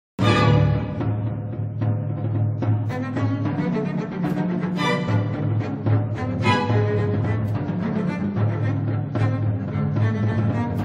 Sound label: concerto for contrabass solo; excerpt